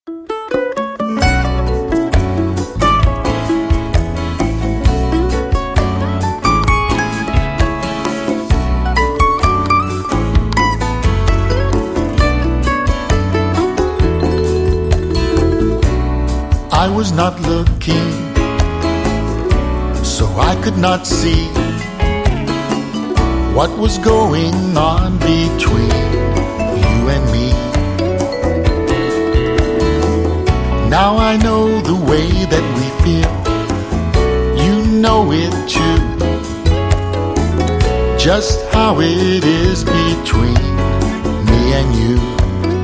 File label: Tropical Country